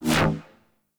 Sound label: Medusa Puzzle Player Fx Enable; Warframe; Duviri